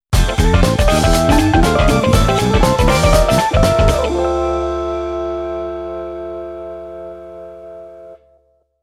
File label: upbeat jazzy modern logo